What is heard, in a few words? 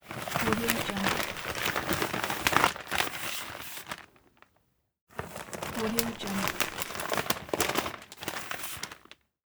fold newspaper